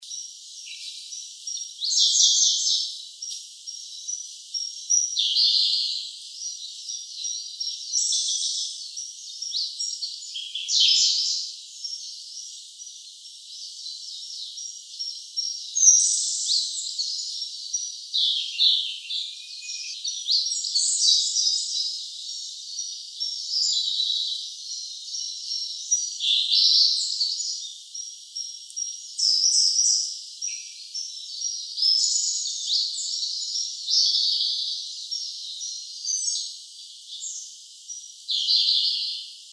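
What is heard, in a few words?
koruri S, Siberian blue robin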